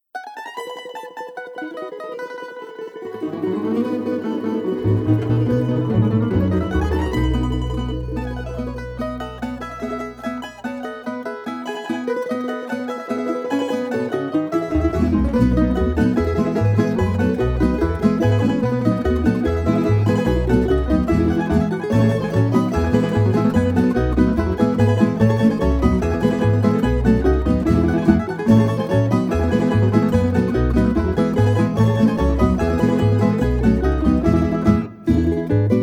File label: polka